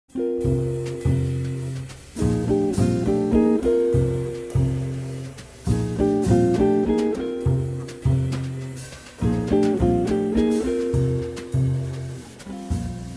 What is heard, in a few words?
Festive Minor